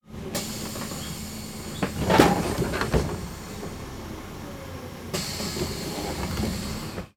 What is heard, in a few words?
Bus Door Opening Closing